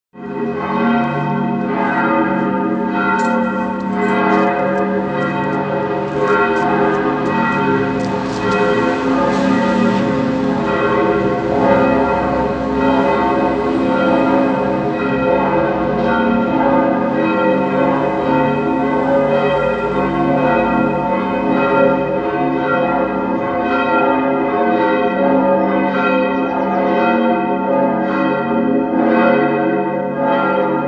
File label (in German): Glockengelaeut